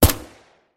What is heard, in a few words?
MP5SD shot; Zomboid; VFE